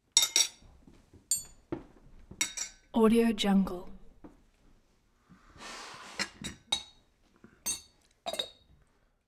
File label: dinner fancy silverware dish movement